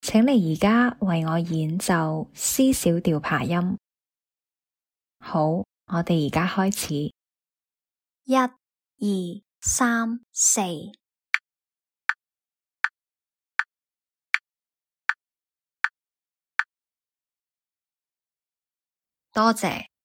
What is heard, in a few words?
C min arp